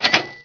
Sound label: Add time